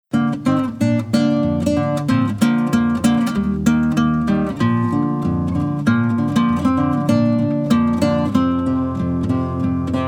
fingerpicking medley

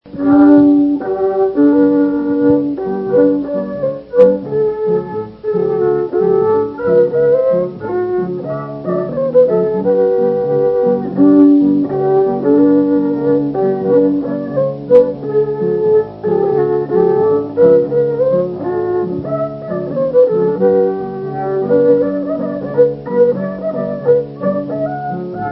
Old German Waltz

